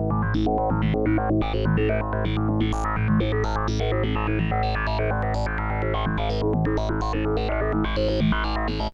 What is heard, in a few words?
S+H G#, SYNTH SEQ; AMG; Global Trance Mission VOL-2